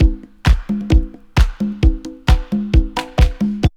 DRUM, 132; Megabass Remix! 2

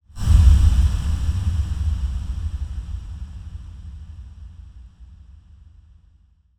Huge Verb Tail, Long Reverb Tails; impact samples

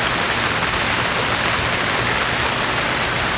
RAIN; AKAI S-Series CD-ROM Sound Library VOL-6; WHEATHER

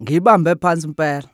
Vocal Chirp, African Voices

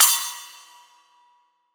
Splash; Roland SPD-S

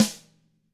DW HIT FD-R, DRUMS SNRD; ILIO; Double Platinum Drums 2

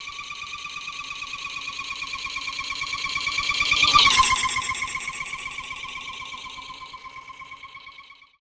BOOMERANG, Anime Sound FX FLYOBJECTS; AKAI Anime Sound FX

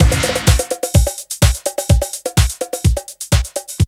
break; Zero G - Man Machine

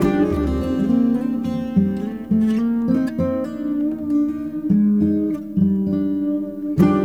Dark Settle, Pastoral; Sonic Foundry (Sony Creative Software) - Friendly Fire

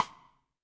Snare, Roland TD-20

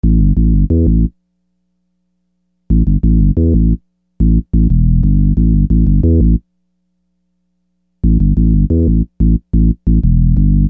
Groove Bass, Hip-Hop Street Beats; Construction Kit; Sonic Foundry (Sony Creative Software)

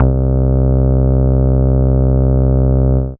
Moog Bass, Dance Synths; Best Service ProSamples vol.37

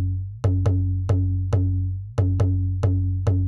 TOM LINE, Techno 138 BPM; Best Service ProSamples vol.54; AKAI

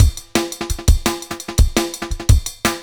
break kit clean, breakcore

